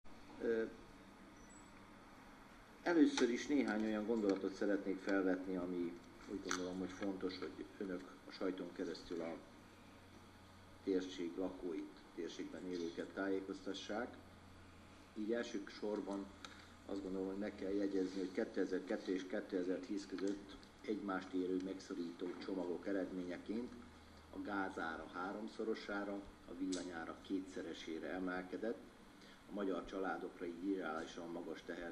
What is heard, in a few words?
digitar